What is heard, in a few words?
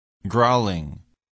growling